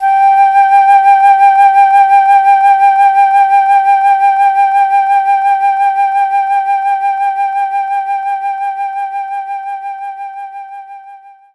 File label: Flute G5; knights try